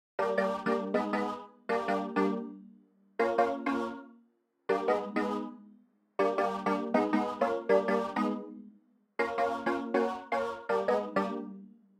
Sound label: Chords; chippy